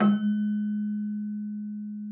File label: Celesta G#3; Xsample VOL-8